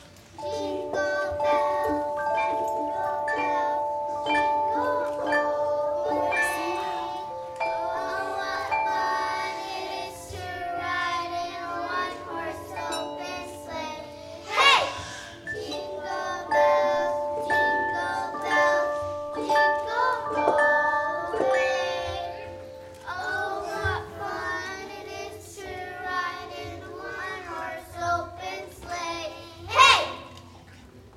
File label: jingle, elementary performs winter concert; talkeetna